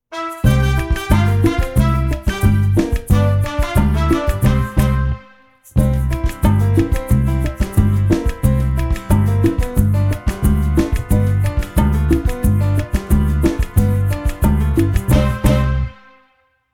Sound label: karaoke